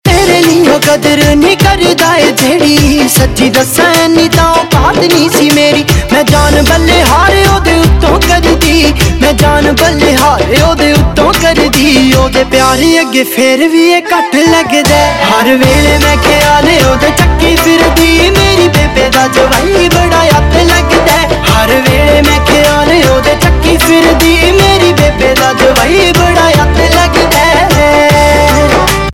Ringtone, Punjabi

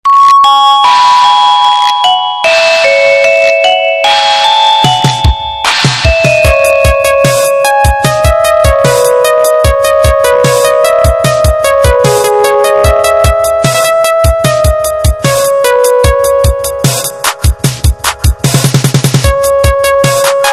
Tone Mix; Airtel Ringtones

